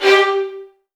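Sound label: VN FX G2 right; ILIO; Kirk Hunter Virtuoso Strings VOL-1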